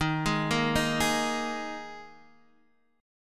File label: D sharp Suspended 4th D sharp, Guitar Half Step Down; chord